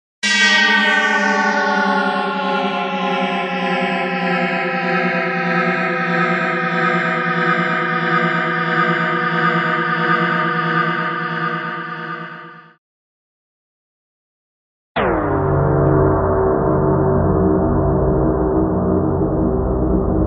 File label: high frequency sound; radio signals